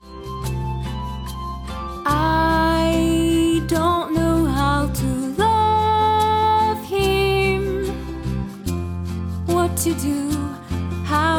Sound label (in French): chant anglais canada accent quebecois douce rassura; jeune